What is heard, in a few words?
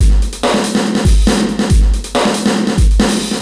E Kit, Drums & Percussion, Electronica; Sonic Foundry (Sony Creative Software) - Electronic Point Blank